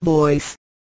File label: voice